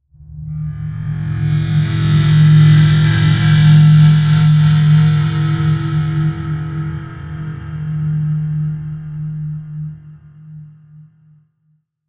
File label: whoosh metallic rumble